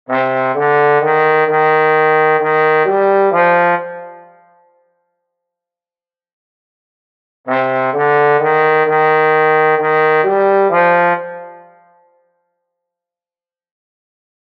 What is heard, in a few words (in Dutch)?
Trombone